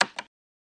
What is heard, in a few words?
Macintosh Sngl Keys, computers